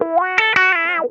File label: CROAK LICK, Funk Guitar; Zero G - Funk Guitar